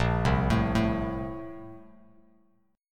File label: Bb Major 7th Suspended 2nd, Bass Standard; BbM7sus2 arpeggiated; JGuitar